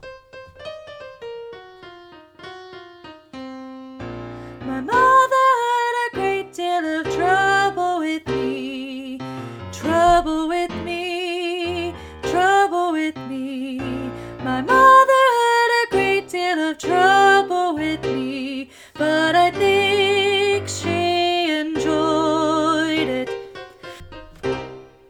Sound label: Soprano